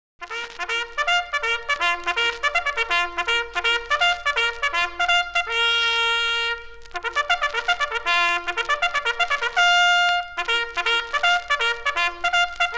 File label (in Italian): Sveglia